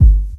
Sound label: Bass kick; Friday the 13th: Killer Puzzle